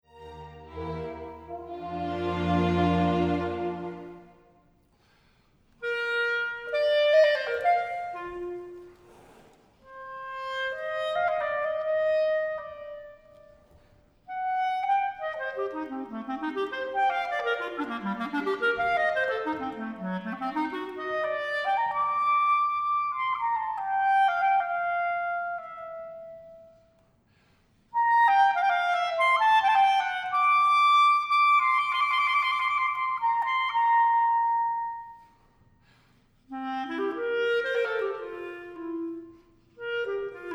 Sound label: cadenza, clarinet; Johan Stamitz mvt 1 cadenza